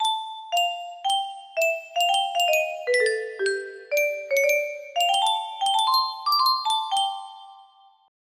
melody, music box

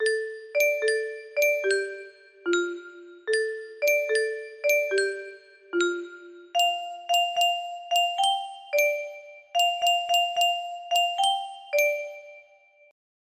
music box, music box melody

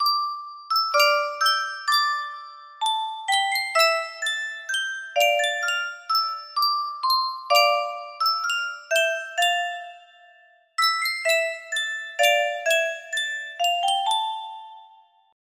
sankyo music box, melody; final fantasy ix

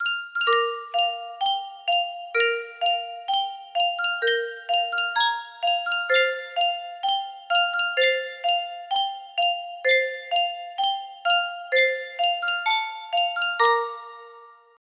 Sankyo music box, music box melody

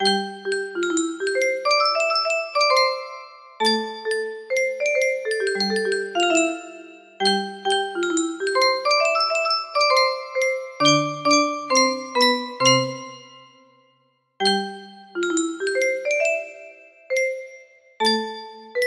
30 note music box melody

